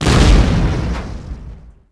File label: explo; impacts explos